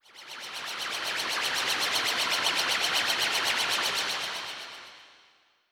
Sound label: Bunshin Rotate; Pac-Man Championship Edition 2 Plus